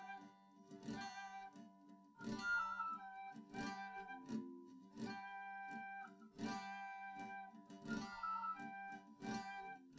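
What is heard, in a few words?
no drums, live recording 250ms 1m; SiSEC11; underdetermined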